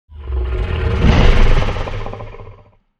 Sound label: Symbol Kentaur; netent